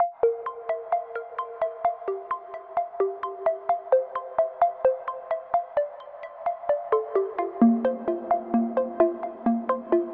Pluck, Sylenth1; Routed Sound; Sylenth1 Expansion - Story Walker Vol.1

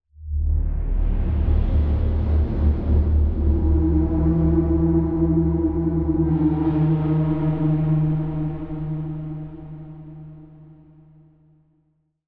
Pad; Routed Sound; Sylenth1 Expansion - Chaos Bringer Vol.1

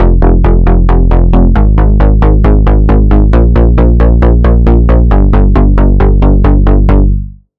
Bass - Tron Beat; Routed Sound; Vital Expansion - Reality Maker Vol.1

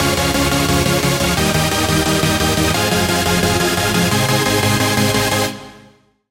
Chord; Magnificent Phoenix; Routed Sound; Vital Expansion - Flame Summoner Vol.1

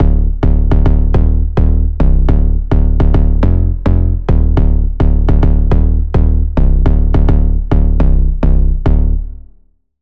Bass; Routed Sound; Sylenth1 Expansion - Noble Gangster Vol.1

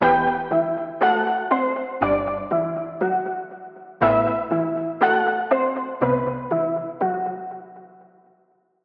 Pluck; Routed Sound; Spire Expansion - Street Vanquisher Vol.1

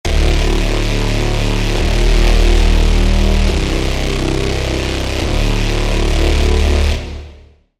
Braam - Terminal Point; Routed Sound; Vital Expansion - Quantum Enforcer Vol.1

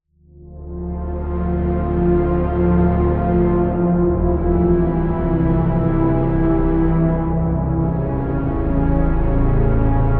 Pad, Sylenth1; Routed Sound; Sylenth1 Expansion - Doom Carpenter Vol.1

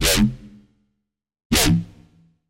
Wobble Stab; Routed Sound; Serum 2 Expansion - Doom Carpenter Vol.1

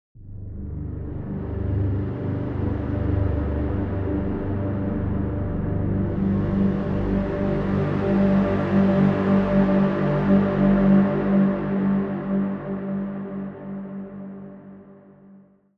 Pad, Spire; Routed Sound; Spire Expansion - Quantum Enforcer Vol.1

